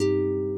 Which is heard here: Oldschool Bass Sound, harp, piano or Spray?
harp